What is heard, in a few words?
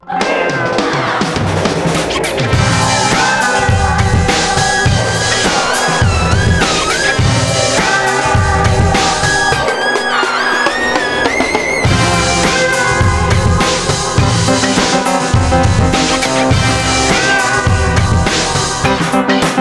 scary groove